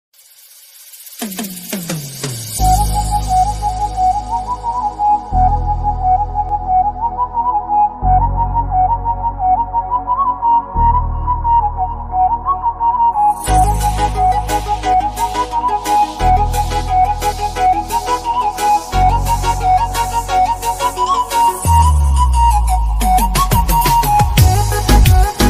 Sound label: instrumental bgm ringtone iphone xr